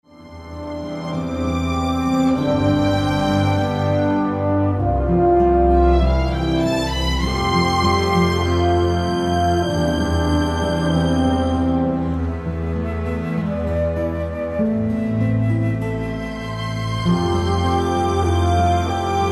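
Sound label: karaoke instrumental; princess; disney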